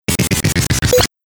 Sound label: Big Nailer, Breakers & Transitioners; Best Of N2O Library